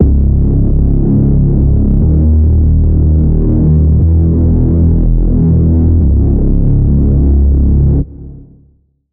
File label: reese, Sylenth1; Reese - Betrayal and Loyalty; Routed Sound; Sylenth1 Expansion - Street Vanquisher Vol.1